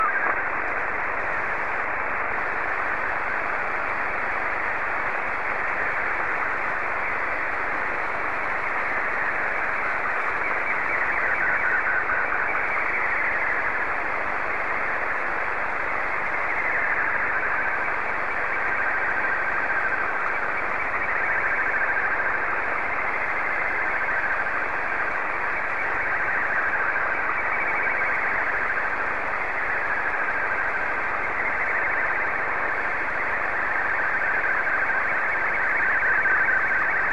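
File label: Martin 2, slow-scan television; BD4SXR; VK4MSL SSTV RX @ QG62ln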